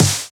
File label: Snares; Vengeance - Essential Clubsounds Vol.4